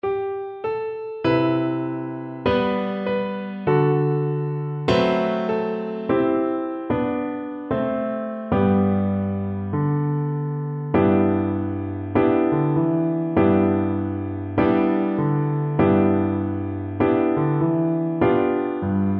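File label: recorder karaoke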